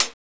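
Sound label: HH, hi-hat; X-Static Goldmine 1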